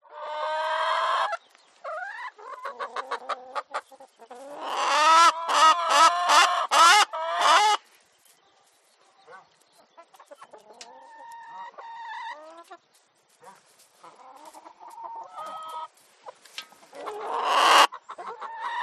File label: Chicken Coop